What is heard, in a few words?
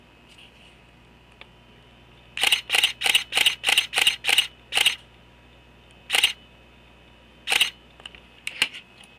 EOS Kiss X4 Shutter Sound RAW